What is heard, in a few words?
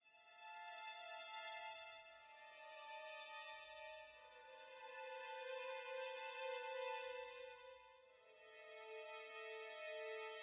ambiant dark